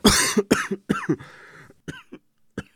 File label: cough